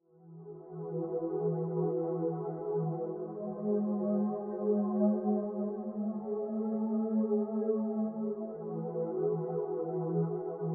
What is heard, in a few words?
Bright Pad Deep 92BPM Emaj Synth Melodic; Runaway